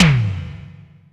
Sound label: U220 REVE TOM; Greytsounds; Scott Peers Sound Engineering